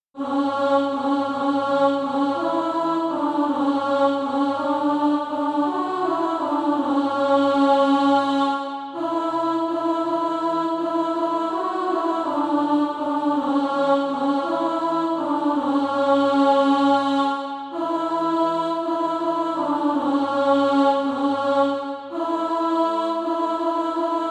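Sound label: alto, Practise Music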